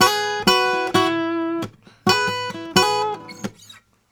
Blues Guitar; ILIO; Fretworks; LICK

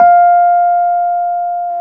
PNO, Electrics, Rhodes

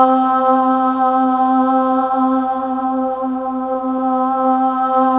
SOFT VOX, AMBIENT LOOPS; Zero-G Ambient 1

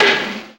WOOD N ROLL, percussive

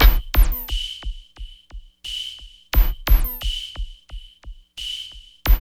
LOOP, Tekno Industrial; East West

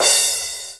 CRASH, Drums Dance & Groove

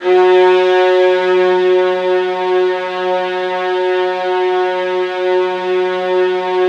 VIOLINS G# R, Synclavier Strings; ILIO

